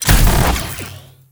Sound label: elec drill